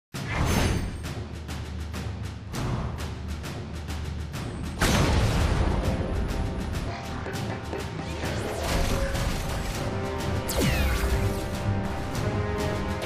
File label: Info Buzz; Disney Infinity